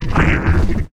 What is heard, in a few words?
PEACE DAMAGE